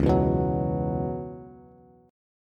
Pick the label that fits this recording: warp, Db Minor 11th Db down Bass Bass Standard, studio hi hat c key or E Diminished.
Db Minor 11th Db down Bass Bass Standard